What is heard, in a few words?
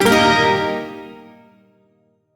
Shawzin Pent Min Chord J; Warframe; Duviri